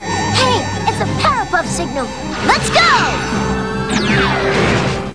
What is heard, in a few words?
midi powerpuff signal